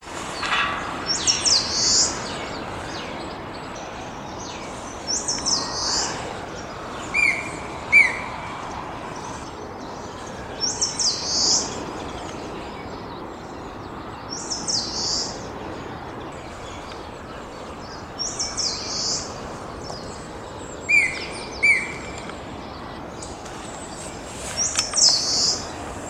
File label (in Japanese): sendaimushikui